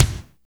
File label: kick, ambient kick; Rhythm Section